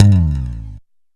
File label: BASS SLIDE, ROLAND JV80; Rubber Chicken Software Sample Bank